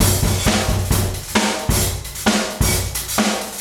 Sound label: DRUMMERNE 132, OFFMIKE DRUMS